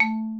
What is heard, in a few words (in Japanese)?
マリンバ; 体鳴楽器; 打奏